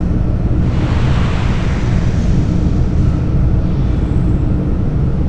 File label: Refinery Big Ambience